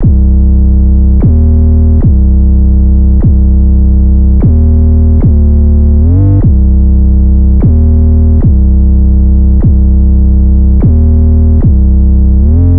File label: 808 loop 150 Cm; Slate Digital BASSHOLE Sample Pack